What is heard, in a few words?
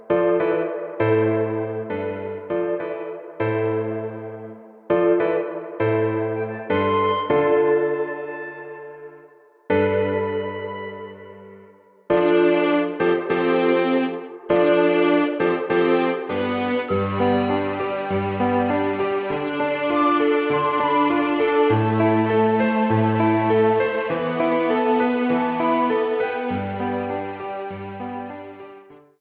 Thoughtful